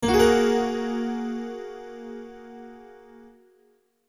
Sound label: item get